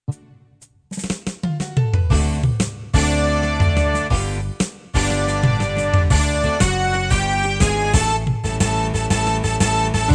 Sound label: Going Up the D String